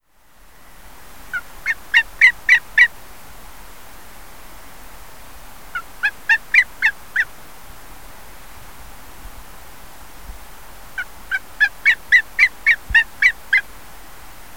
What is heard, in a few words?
harlequin hen solo over inlet, harlequin duck